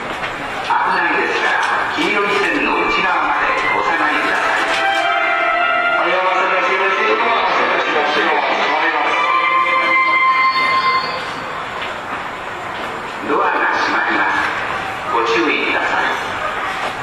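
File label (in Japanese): 発車メロディ, 大崎駅, 山手線; 始発専用です。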